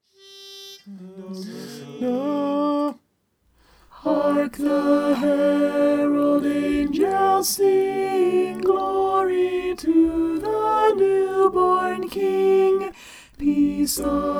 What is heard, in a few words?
hark the herald alto